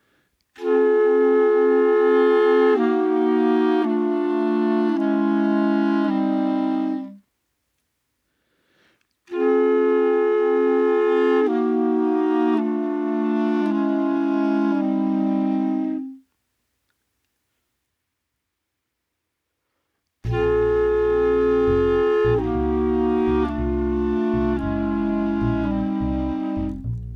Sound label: Horn Break Together with bass